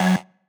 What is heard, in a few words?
marimba; ゆめ2っき